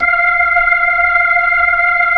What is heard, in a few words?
B-3